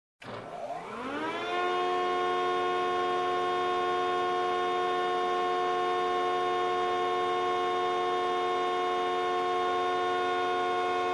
Construction

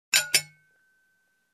Closing porcelain pot

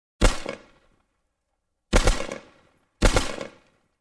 Machine gun with echo

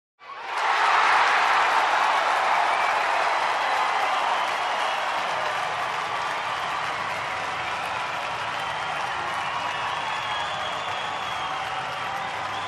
People celebrating shouting and clapping

